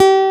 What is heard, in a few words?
PICK G2, Bass Guitar; AKAI S6000 CD-ROM - Volume 1